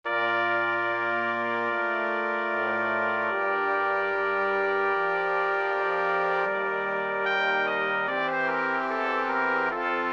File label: Brass Quintet; Air by Bach